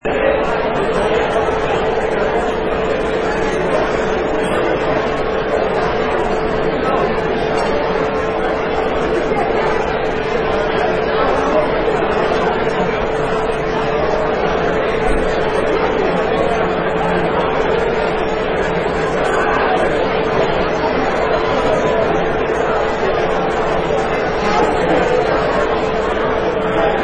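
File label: Huge Banquet Ambience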